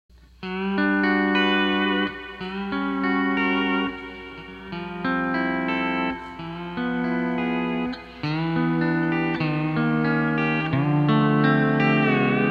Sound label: E 9th Slow 4 Drop 9th